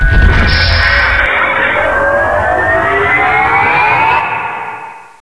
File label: manta ship undock; ambience